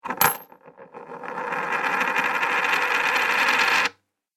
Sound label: Coin Falls Then Heavy Coin Spins; Money Sound Effects